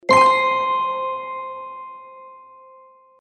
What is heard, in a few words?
Dense Notification; HTC; Motif